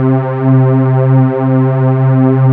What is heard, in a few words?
PAD WARM, Nord